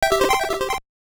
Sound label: Jingle, soundtrack; Medarot Perfect Edition Kabuto Version; WonderSwan